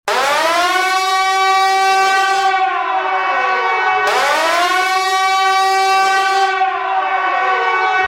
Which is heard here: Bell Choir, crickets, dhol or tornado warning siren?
tornado warning siren